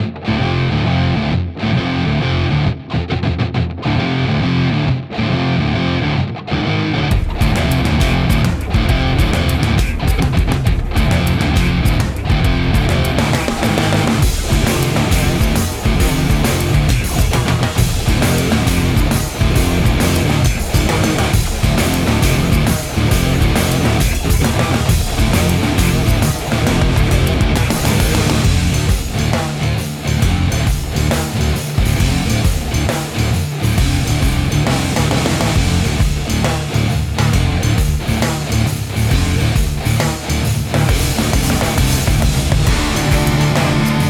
music; Garfield dmx minigame3

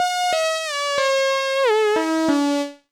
Beat

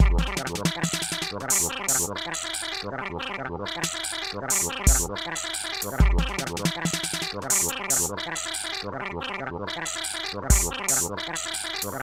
Happy Town D - Cartoon

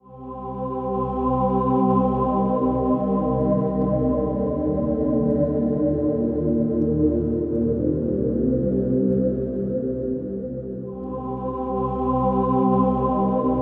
War Background; starpoint gemini

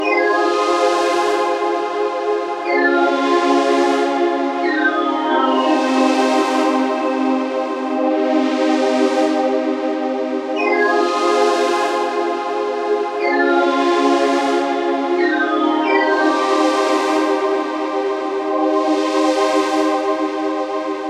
highway stage synth

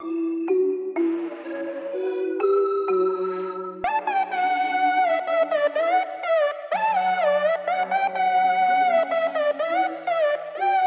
(Instrumental); Friday Night Funkin' Upside

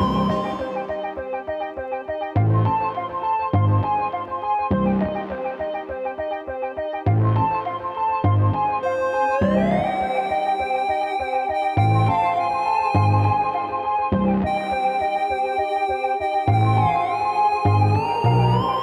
Claws Loop Nodrums 8bar

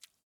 water jump

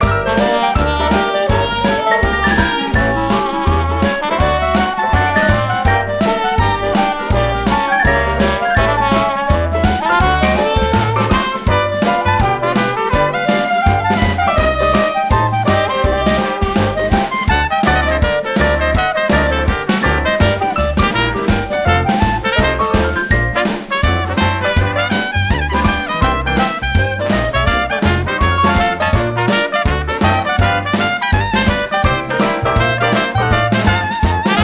Diner Loop; The Princess and the Frog; wii windows; Frog Music; Hub Diner Loop

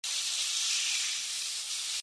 Sliding

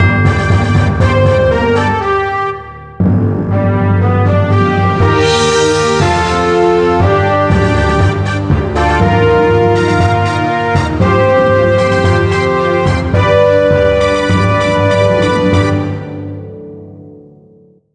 Jingle Game Clear; 1000m Zombie Escape; 3DS

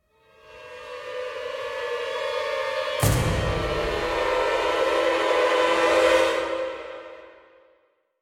Stinger Boss; Grom02

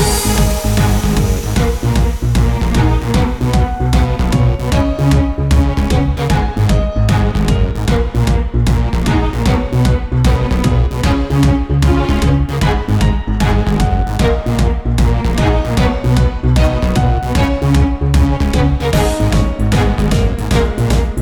music prospering; Castle Storm

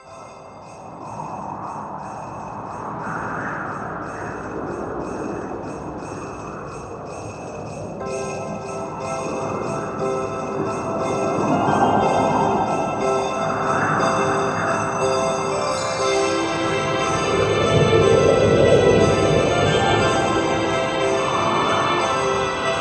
Snow Style

